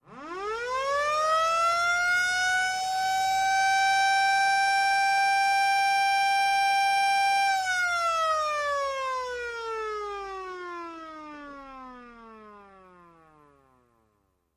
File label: Siren Long Windup Dow